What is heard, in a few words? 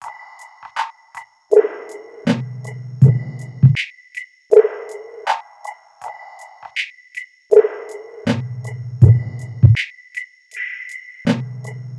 Subtle Loop; Sonic Foundry (Sony Creative Software); Esoterik Beatz